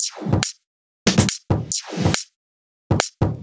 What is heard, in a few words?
Loop 140 BPM; vengeance essential club sounds-3